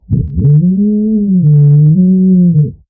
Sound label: herb call